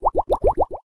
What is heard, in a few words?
hold bubble drag; SKY; IM-A890S VEGA Secret Note